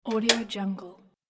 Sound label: tumbler